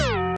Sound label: tilt; BALLY